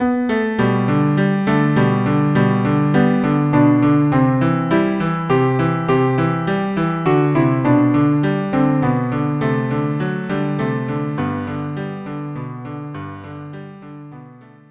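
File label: cello duo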